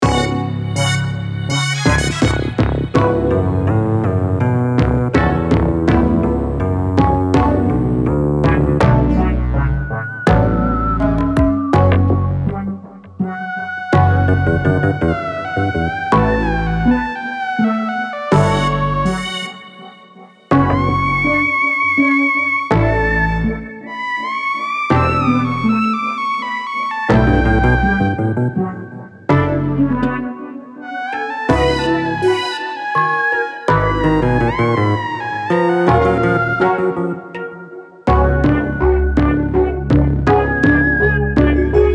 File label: DARK